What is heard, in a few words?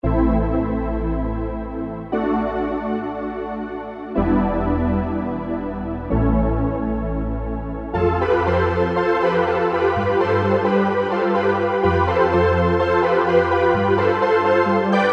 Story Pad, Roland JD-990; Factory Patches